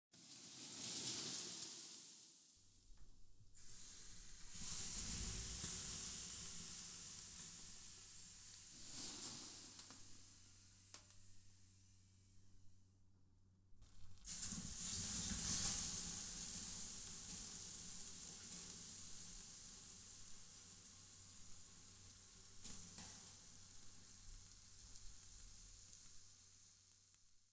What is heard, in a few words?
atmos sauna optional; Hollow Knight: Silksong